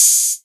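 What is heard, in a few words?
808 Hat Open; Novation DrumstationV1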